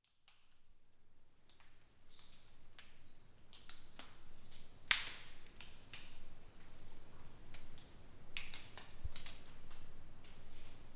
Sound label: water dripping